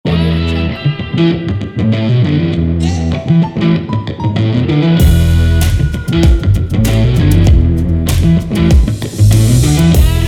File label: fashion stylish rock